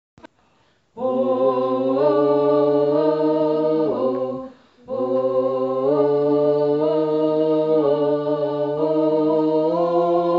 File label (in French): basses